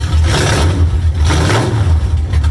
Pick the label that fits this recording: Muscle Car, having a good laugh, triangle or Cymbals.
Muscle Car